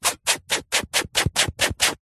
foley abrasive foam or sponge rub clean concrete strokes